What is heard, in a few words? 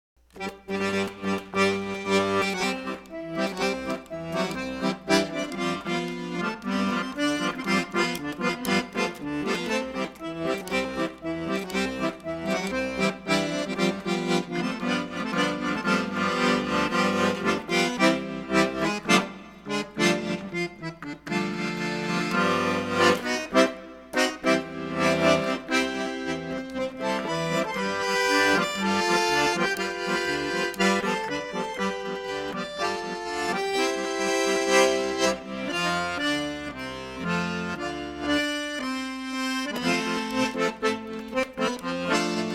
Polka, accordian